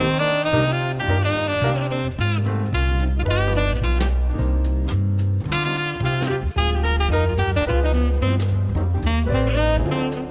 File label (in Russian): безупречный Музыка для чтения Звуки